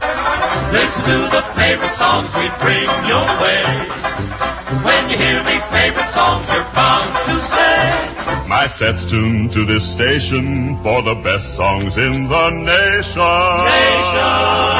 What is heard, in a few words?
jingle; kioa